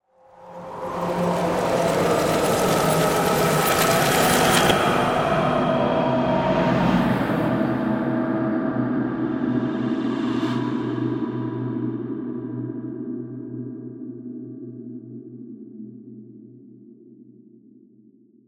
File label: Ghostly Ethereal, Horror, Ghostly, Ethereal, Swirl; Ghosts (Sound Effects:Horror); BlastWave